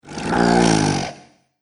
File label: That Beast Can Snore, monster; Monster Sound Effects; A Fantastic Monsterous Sound Effect